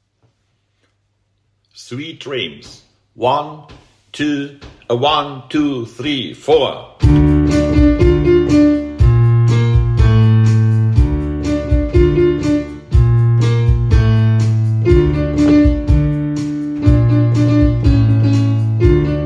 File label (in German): Klavierbegleitung